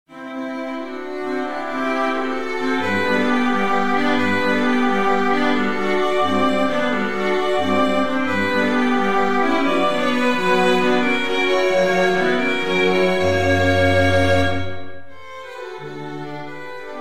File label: string trio